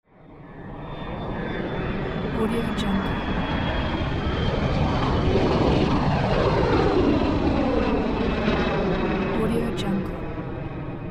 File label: airplane engine sound